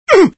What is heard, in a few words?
Fem Pain; Extra Lives